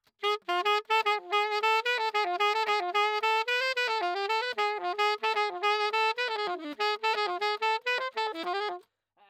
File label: Sax